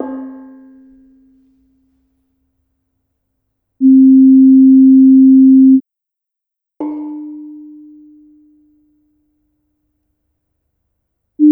Bonang Barung Sl with sines

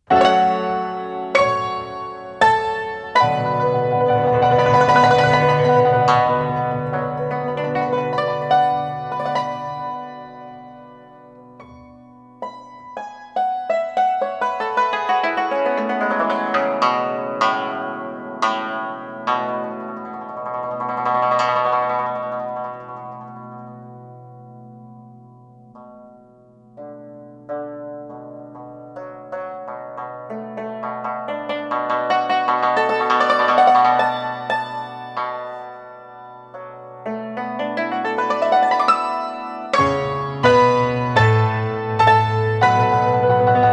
Modern Chinese music

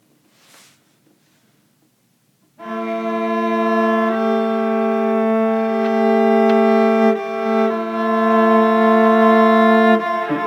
Cello

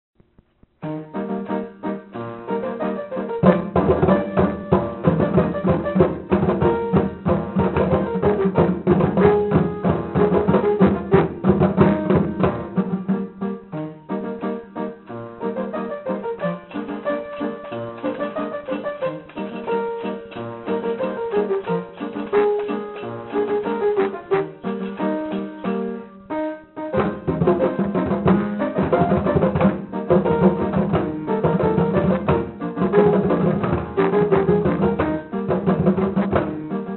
Neapolitan Song, Rhythm and Drumming Ensemble

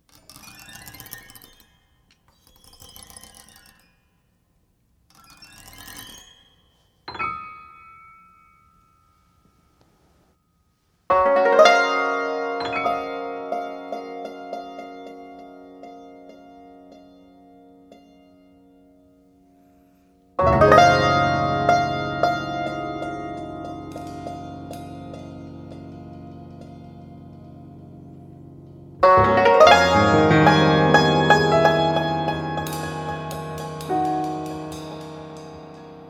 free improvisation

